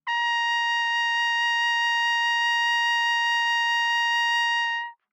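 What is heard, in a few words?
Trumpet vibrato ff Bb5 stereo, Bb Trumpet; MIS Pitches - 2014; Brass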